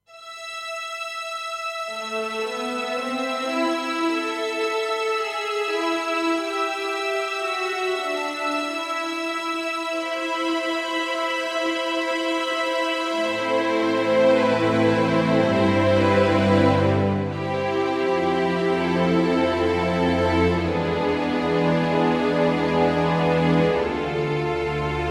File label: Rich Strings